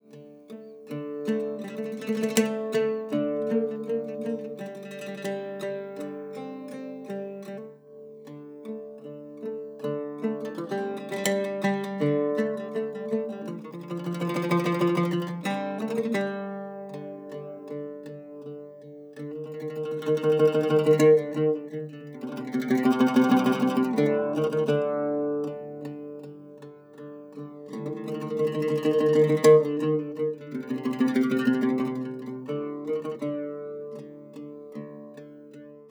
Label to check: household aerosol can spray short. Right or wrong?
Wrong